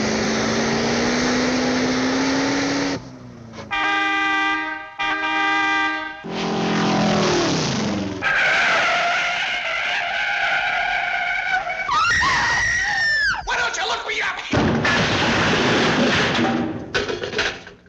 toon car

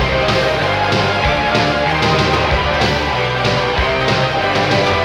keys and guitar, loops, phonky

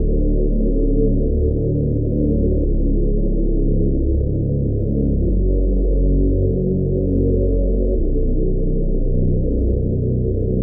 blown out bass; Loops; Electronic

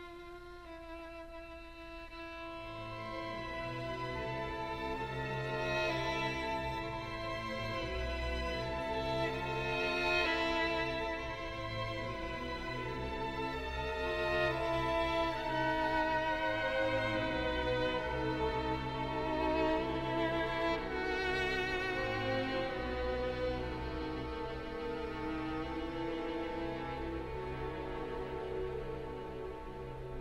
strings overlay, Sad Loops

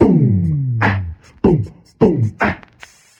uh oh whatcha gonna do, Beatbox, Drum loops

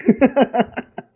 laugh

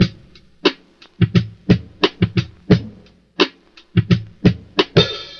line noise, Boombap drum loops

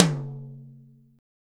Toms

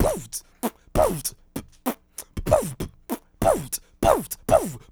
blubber, Beatbox; Drum loops

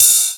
Hats Electro Open

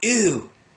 ew; ooh ahh

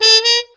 honky, Stabs

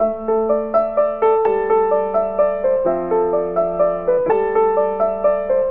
piano, Loops Sad

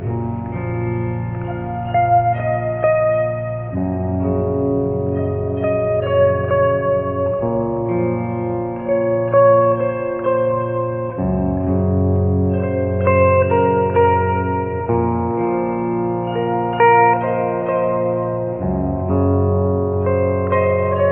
guitar, Sad

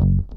dasterdly, Bass

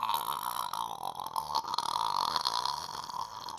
ahhhhhhhhhh, gibbrish; Voice

